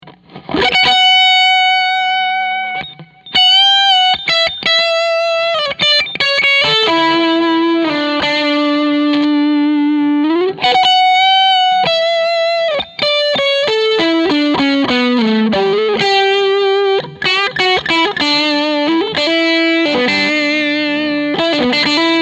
ODS PRS OD Solo Big Chords

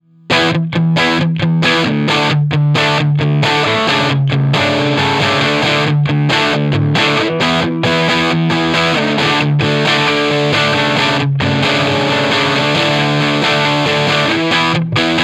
TC15 DIRTY Fender Oxford

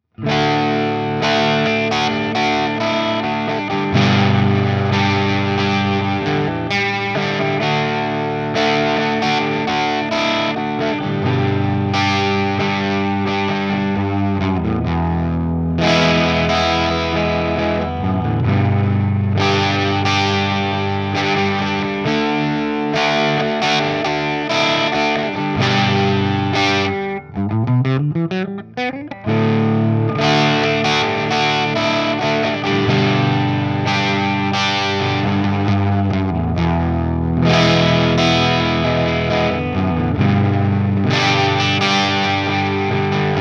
TRIWATT 12AT7 OD Epi Sheraton Middle Greenbacks; trinity

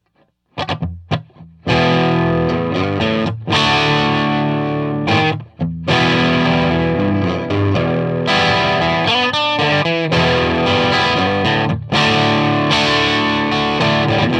Gretsch Dirty, Trinity Triwatt Amp

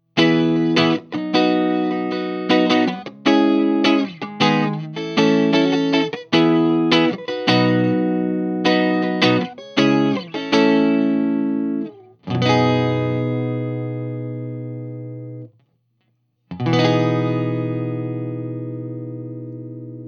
18W CLEAN

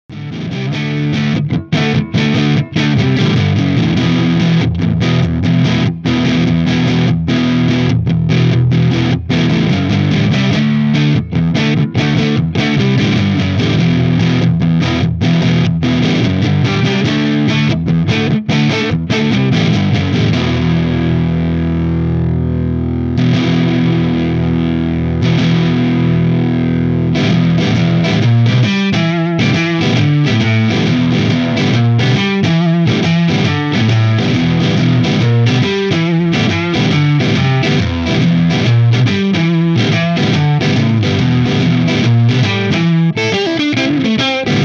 TC15 Ch2 Hi Crunch Hi Cyclone SD59 Neck Mesa V30

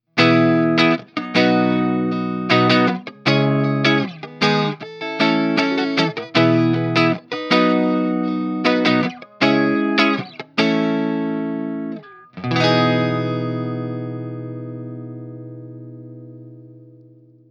18W CLEAN Marshall Greenbacks

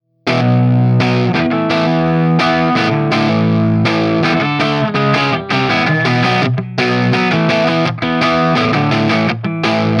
18W DIRTY 6V6 Marshall Greenbacks; 18 Watt v6

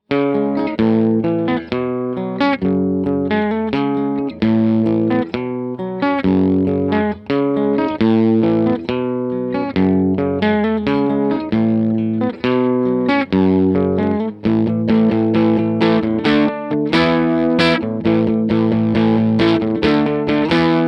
TC15 Dirty Crunch; TC15 Ch2; Hi hiINPUT; SG

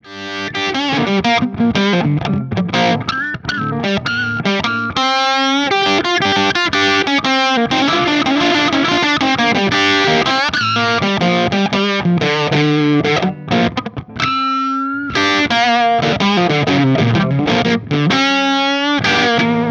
JTM45 Input 2 Hi Cyclone Bridge Mesa V30; L JTM6 Input2Hi Cyclone Bridge MesaV30

